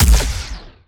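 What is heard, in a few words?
Laser gun Fire; Hyperion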